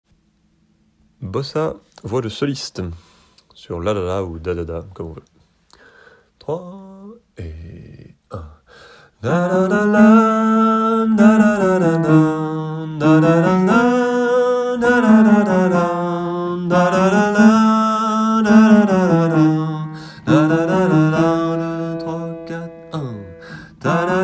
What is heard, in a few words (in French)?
solistes; Bossa